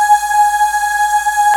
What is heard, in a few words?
Choir; CMI